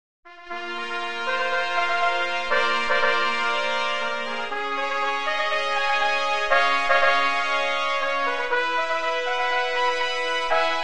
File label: Fanfare for Six Trumpets; Cuthbert Fanfare; 6 Trps